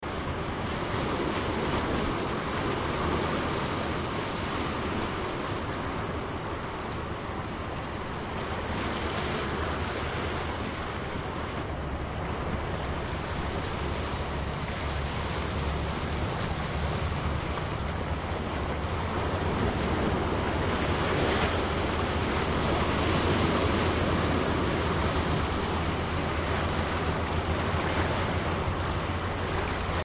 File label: atmo pismo tide pools